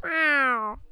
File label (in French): Chat